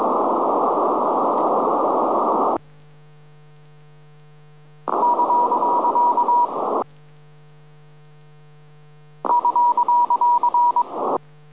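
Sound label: ECHO CW, Amateurradio; EME-Echos; with 8 x 144x28 on 2m; DF6NA; Two samples for EME-Echos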